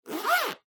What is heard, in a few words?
Pencil Case Open